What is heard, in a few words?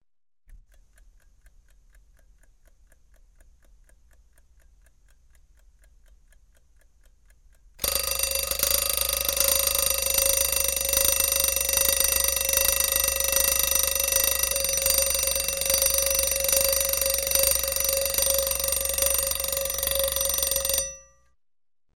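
old alarm clock ticking then ringing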